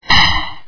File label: Attack